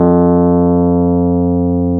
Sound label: PNO, Electrics, Rhodes; Club-50 - Foundations Roland